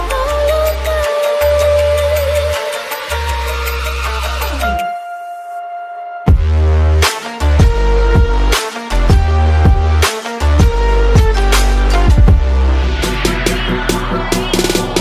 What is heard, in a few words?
latest english ringtone